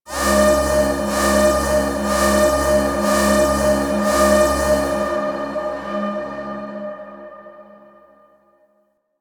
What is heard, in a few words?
Wave Alarm; Warframe; Missions; Zariman; Zariman Assassinate